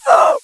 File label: Female leg hit